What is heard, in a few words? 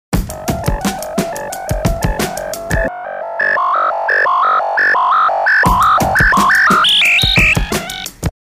beat sync example wet